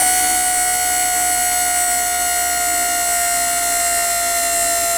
Camera Zoom Out; Samsung SCH-A915

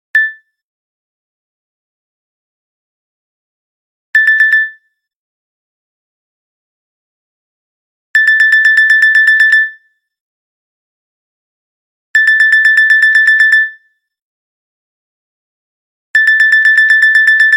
Clock alert, Nokia alert tones; Nokia C2-01

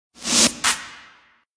wind up; Sony; C1905 Xperia M

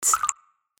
lock notification; Orange Rise 54

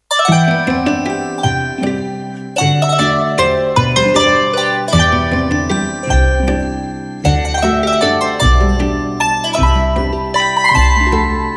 Rain dance, ringtones

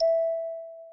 Class Electronic, notifications; OnePlus 11

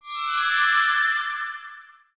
Shimmer; Palm Pre

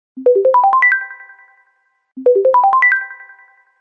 Soft Ring; LG SU370 Optimus One; ringtones

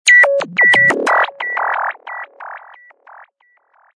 Sci Fi, Ringtone; HTC Freestyle

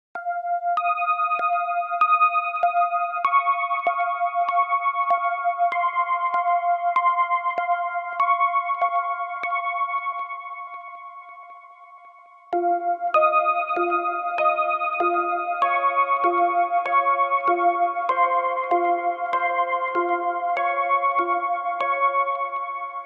wakeup; Nokia; 8800 Sirocco Edition

